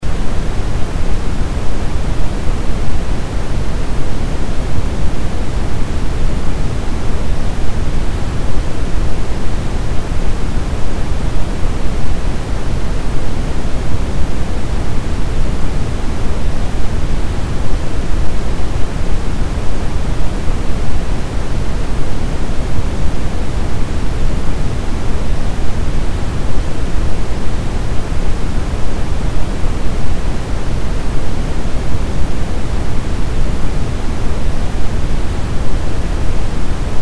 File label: Deep Brown Noise; sleep calm chill noise meditation podcast